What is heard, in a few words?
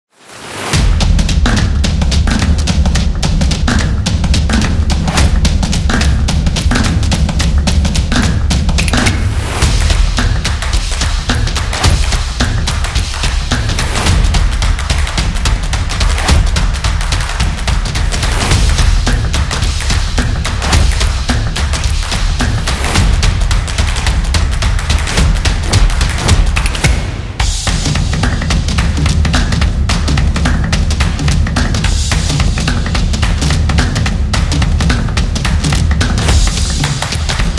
The Drum Energy; football promo